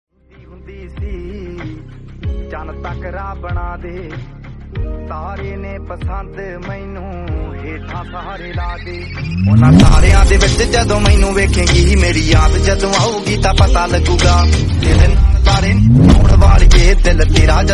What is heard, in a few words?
punjabi